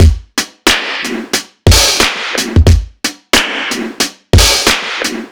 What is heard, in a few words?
Rimshot